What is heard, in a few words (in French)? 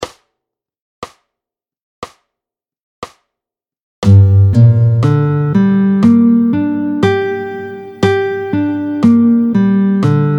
Arpèges mineurs Solm Dom, tempo 60; arpèges au médiator